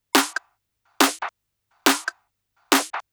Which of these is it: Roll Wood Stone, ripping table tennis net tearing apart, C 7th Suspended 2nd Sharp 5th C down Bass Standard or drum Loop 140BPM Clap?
drum Loop 140BPM Clap